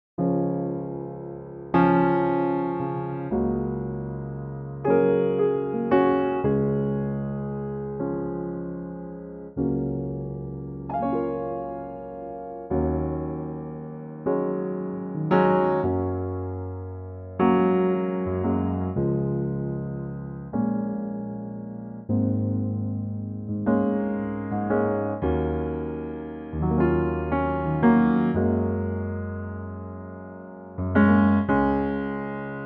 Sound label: Db piano; key - Db; more open and chordal based, with a slight swing